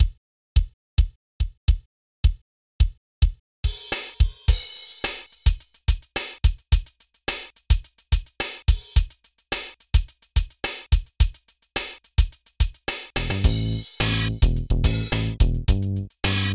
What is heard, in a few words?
Drum Test; ft2